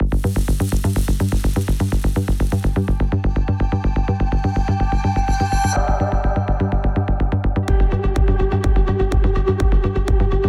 דיפ האוס

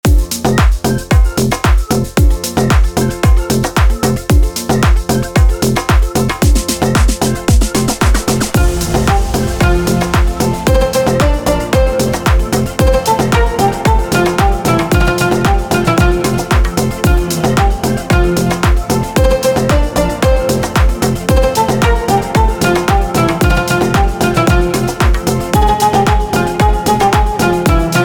אפרו האוס; חב''ד

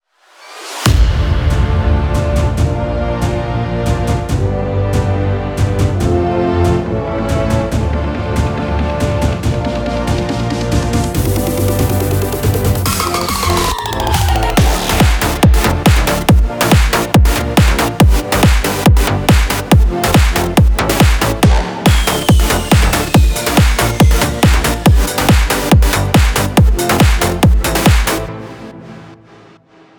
טראק כיפי

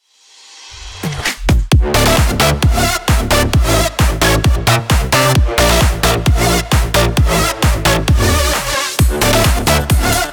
דרופ; synthmaster

